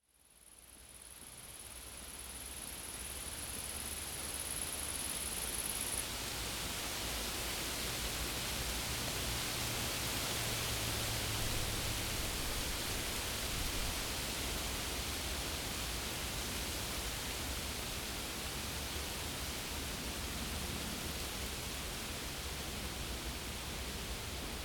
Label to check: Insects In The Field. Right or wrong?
Right